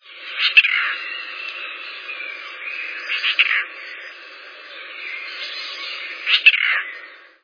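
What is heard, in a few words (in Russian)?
белка издаёт непонятный звук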